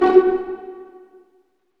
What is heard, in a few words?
Vlns Pizz; Roland L-CD702; Vls Pizz